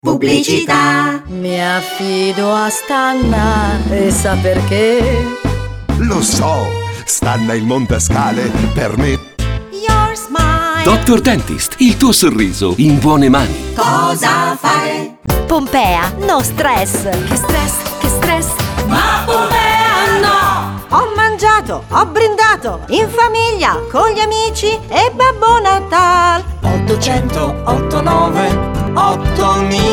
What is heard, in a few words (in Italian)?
spot cantati